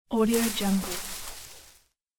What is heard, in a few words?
bashing tree branch with needles; ضربه زدن به شاخه پر; nature sounds